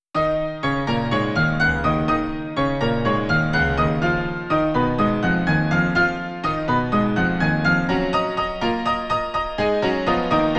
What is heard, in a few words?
Dusty Keys, PIANO